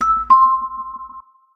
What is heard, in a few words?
Door Bell, notifications; Samsung Galaxy Note 9